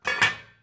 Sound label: Metal Pot Cover